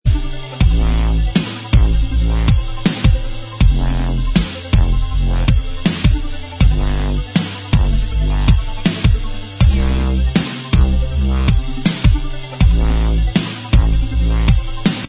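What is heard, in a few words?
dubstep remix instrumental track, backing tracks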